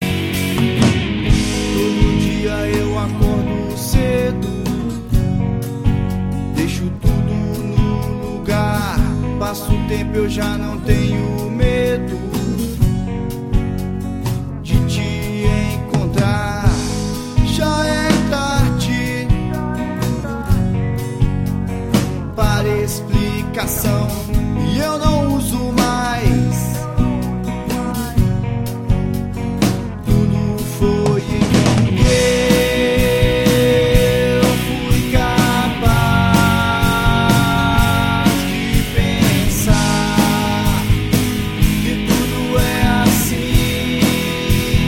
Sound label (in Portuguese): sem efeito